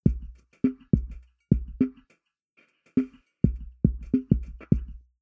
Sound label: drums impulse 40m centre 10m infront 1st palisade outside; Goseck